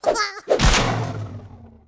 Switch Activate; Rabbids Coding

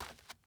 dirt step

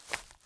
foliage step; Teardown; Xbox Series XS

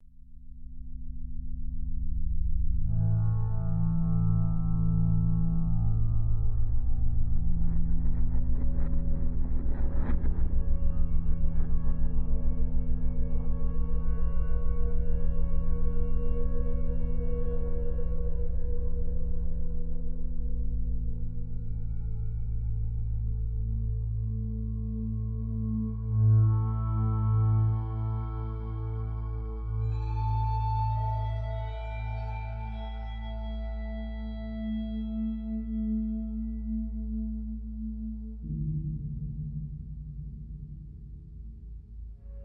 forest crash ambient tonal

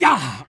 voice male hurt pain; voice male b; Infernal Radiation; PC, Switch, Linux, macOS

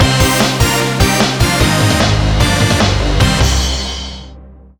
Music Game Over; battle axe

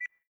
Error

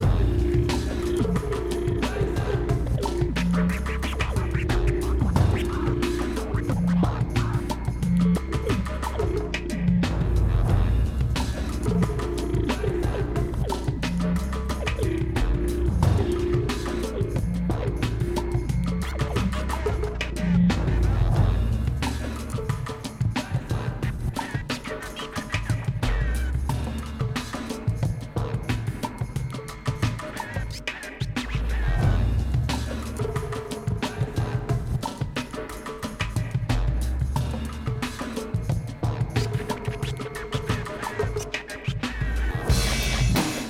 Rhythm; PixelJunk Shooter 2; ps3

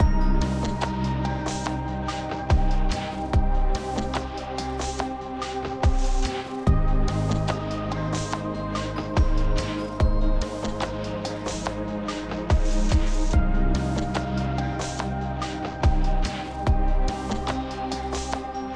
Bonus, 72BPM

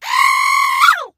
Pink Launch

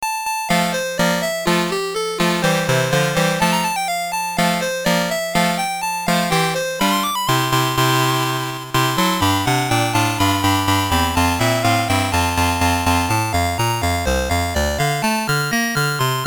BGM, WonderSwan Color; tetris

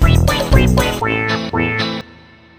Level Failed; Angry Bunnies Colossal Carrot Crusade

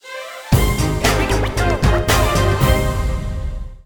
loop ultimate goal short; Garfield dmx minigame

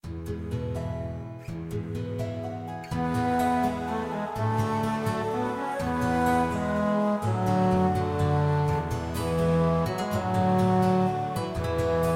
Epic Throne Room; Trouserheart; Android

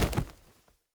Land Feet; Afterbern Democralypse

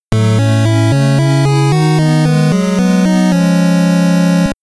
Heart Tank Acquisition Fanfare; TTGD's Highest Quality Rips Vol. 3; TTGD Gold

